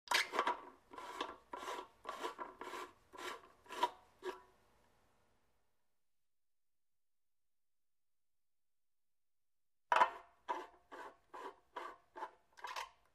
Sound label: Motorcycle gas cap